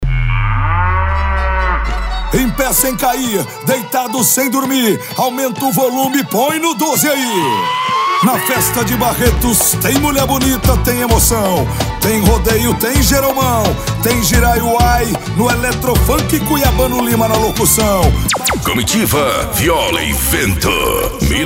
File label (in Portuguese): comitiva viola e vento